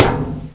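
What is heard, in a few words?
crowbar hit; weapons; caged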